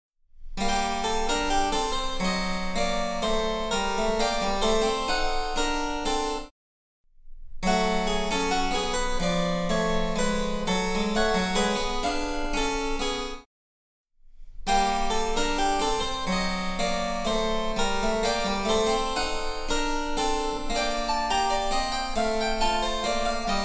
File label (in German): Cembalo; Mark Lindley WTC 1